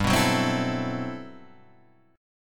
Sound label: G Major 13th; strummed; JGuitar